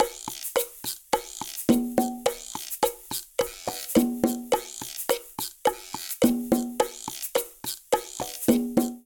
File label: Charanga Cha cha cha No Conga 106bpm; Latin Percussion; Ableton; Factory Packs